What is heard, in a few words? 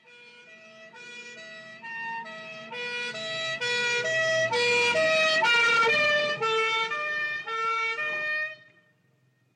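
European siren with Doppler effect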